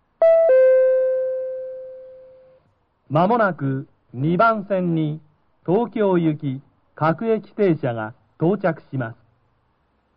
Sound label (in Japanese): maihama sekkin